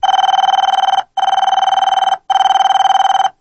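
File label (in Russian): неугомонный звонок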